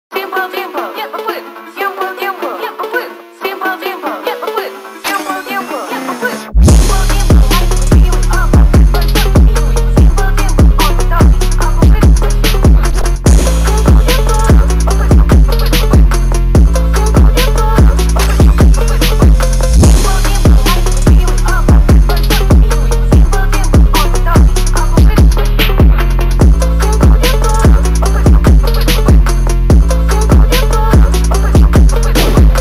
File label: phonk drill remix